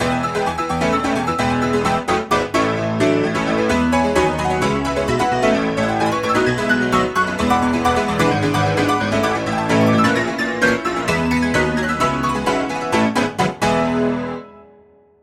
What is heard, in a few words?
Piano Solo Wet